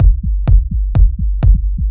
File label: house techno beat passage 126; drum loops; am